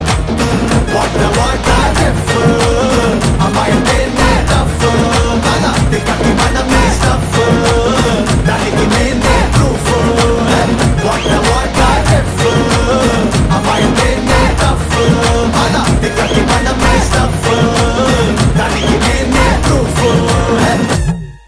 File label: fast beat; telugu